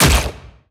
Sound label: cage wall bounce